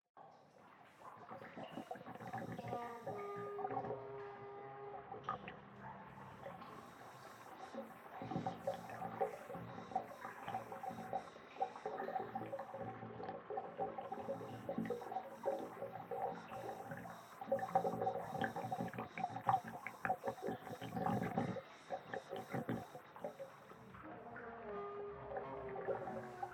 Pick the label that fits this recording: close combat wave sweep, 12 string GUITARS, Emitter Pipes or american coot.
Emitter Pipes